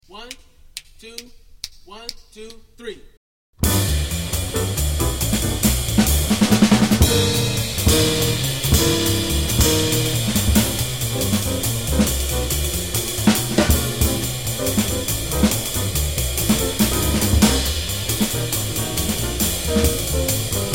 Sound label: minus Melody